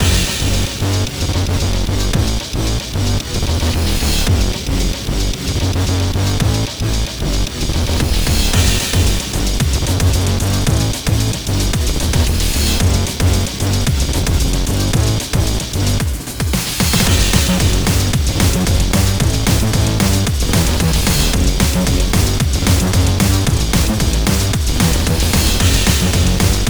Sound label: Instrumental; Friday Night Funkin Daves Halloween Bash; Intervention